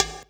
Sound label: Conga; Equipped Music; Loungin House